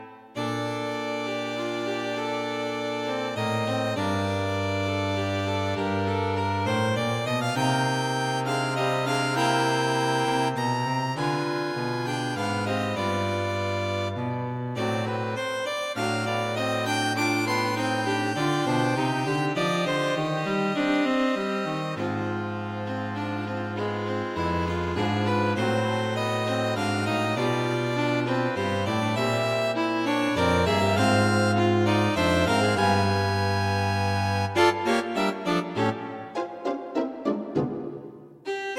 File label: String Quartet for Concert performance; A modern piece straying towards a jazz-like style.